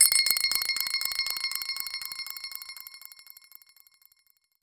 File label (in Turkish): casino rulet ark sentetik